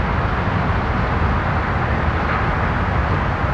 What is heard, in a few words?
city amb; Re-Volt